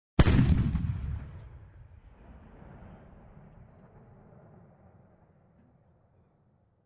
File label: Boomy Shotgun Shot